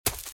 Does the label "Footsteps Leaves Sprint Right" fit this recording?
Yes